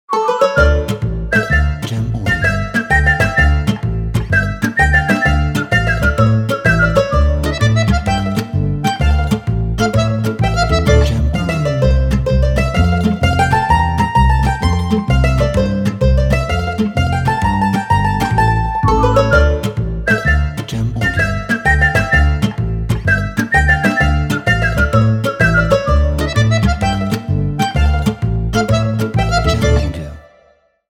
Italian Pizz

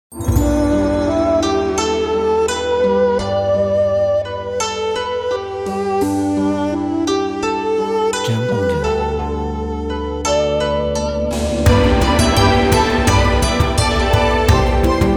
Asian China

